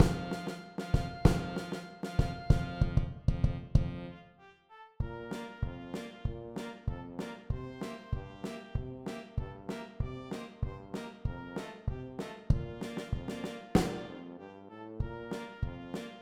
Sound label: perc